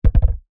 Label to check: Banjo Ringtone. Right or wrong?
Wrong